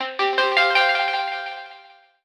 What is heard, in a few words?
Spring Board